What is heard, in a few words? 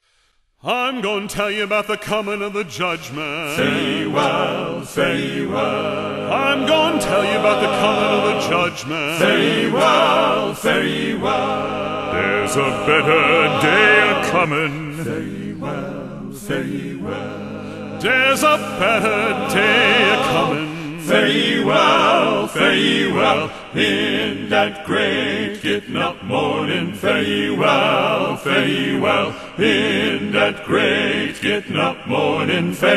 men's chorus